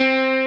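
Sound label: Arpeggio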